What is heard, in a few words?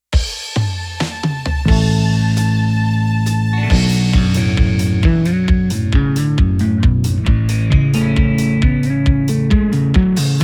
Finger Reverend Guitars; Reverend Guitars Decision P Midnight Black